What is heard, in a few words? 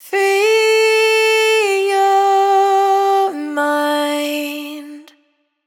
hook 135 Dm wet, Vocal; Slate Digital Omnivox Vocal Sample Pack